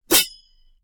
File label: Knife Slash Spike Trap Sharp Sound